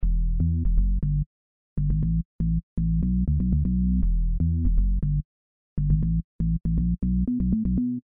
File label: muted bass